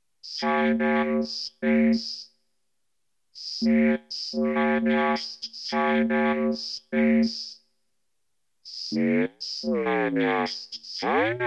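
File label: Vocoder; flame2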